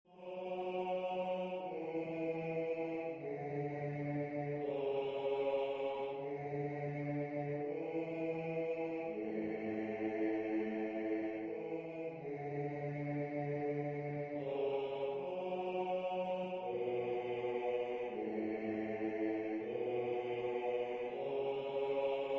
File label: bass